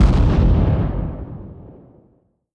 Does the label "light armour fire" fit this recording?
Yes